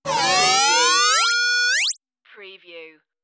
tonal transmission interference; radio; AM / FM tuning, static radio sounds

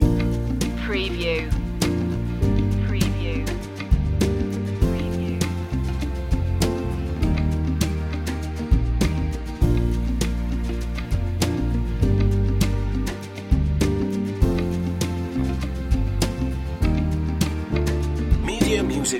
inspirational heart loop

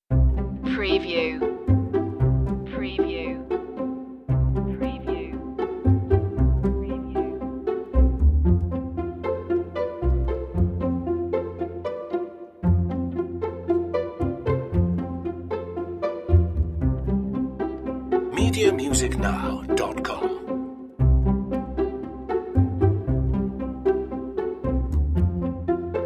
best friends strings only

